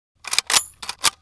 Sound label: spring bolt, weapons foley; Spearhead Demo; MacFormat 2003 June